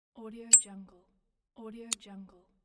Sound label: grenade pin pull